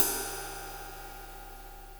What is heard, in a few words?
20 inch jazz club ride, cymbal; byzance; Meinl